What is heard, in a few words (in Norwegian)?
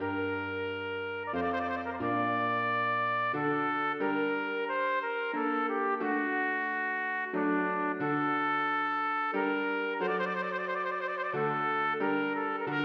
Aria for to trompeter, horn og piano; Bang